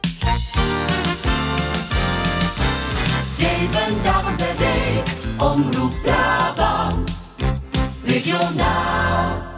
omroep jingles

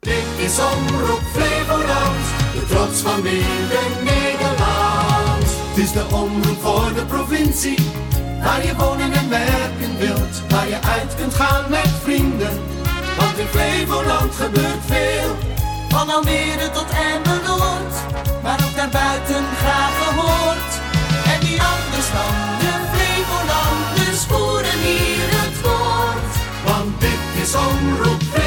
omroep jingles

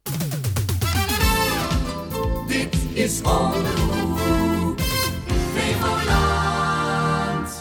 omroep jingles

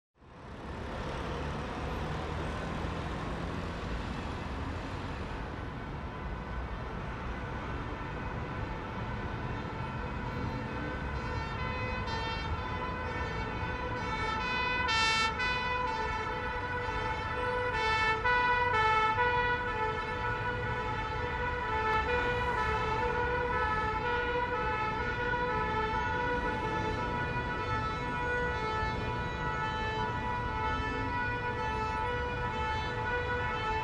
Brandweer; Rosegarden

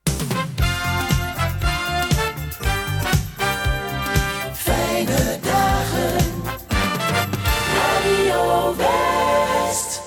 omroep jingles

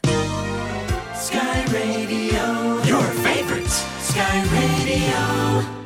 omroep jingles

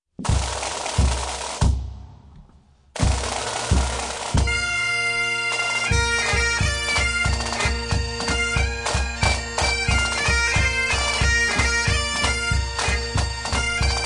Doedelzak; Rosegarden

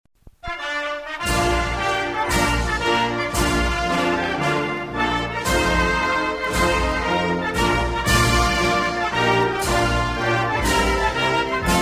Belgisch Volkslied; Rosegarden

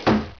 FLOEP; Zoemtonen hisses pingen woesjes tikjes

